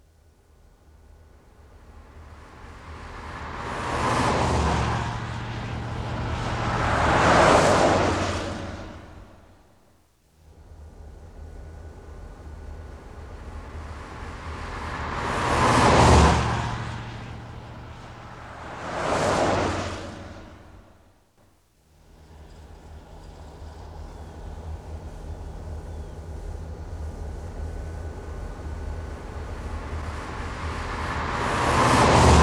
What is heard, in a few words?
Streetside Cars Passing By